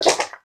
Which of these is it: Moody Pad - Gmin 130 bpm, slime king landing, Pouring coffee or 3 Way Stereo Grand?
slime king landing